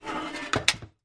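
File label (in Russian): zvuki tostera, toaster sounds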